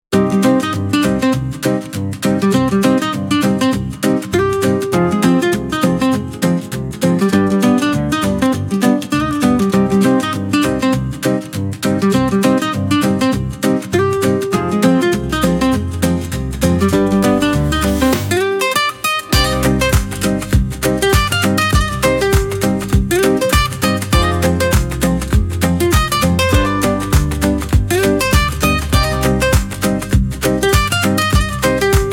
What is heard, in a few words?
100 bpm Spanish Rhythm Guitar, instrumental; 60 sec; ai social media music